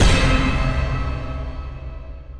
Win High Start; netent

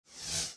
sparks mirrorcopy Row; netent

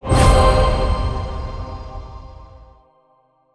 medium win new; netent

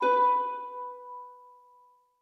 melody B4; netent

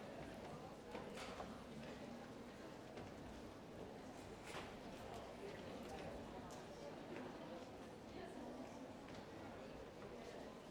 Wait Loop; netent

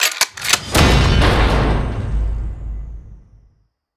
super Mega Win; netent

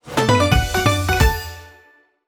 medium Win

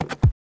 reel bounce; netent

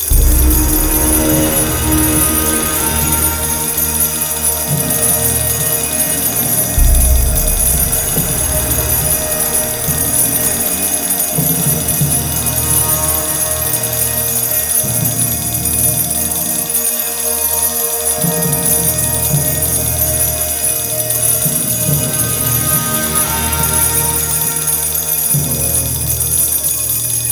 big Win epic; netent

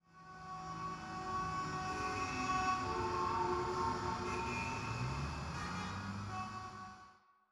amb pool; netent

